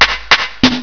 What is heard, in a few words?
DRUMMER